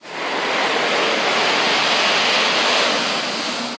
shoe dragging forward on carpet; john-travoltage

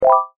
Compound w Low Pass Filter

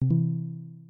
balloon Pickup E; balloons-and-static-electricity

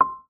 Check Box Select

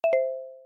particle Drop; build-an-atom

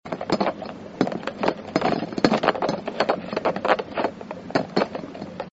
jostling; john-travoltage

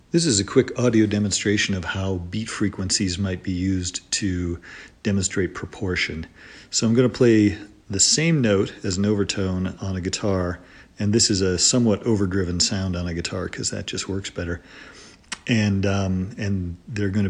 beat frequencies

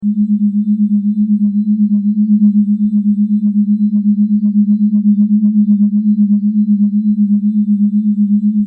Force Loop; gravity-force-lab-basics

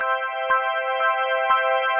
pure bell 8ths loop; balloons-and-static-electricity

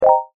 Compound w Low Pass Filter; phet io wrapper sonification; molarity

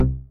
failure to drop in atom; build-an-atom; PhET; sonification

